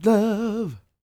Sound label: CROON, Vocal Blues & Soul MELLOW